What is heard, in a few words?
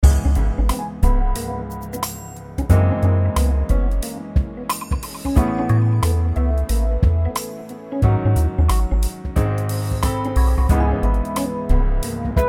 background; RS Games Sounds